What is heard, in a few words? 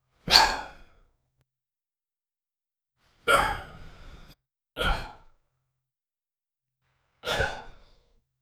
male suppressed sob with, despair